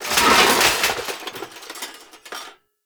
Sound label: Desktop Metal Destroy; Ambience; Gameplay; Warframe; Entrati